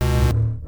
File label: 8bit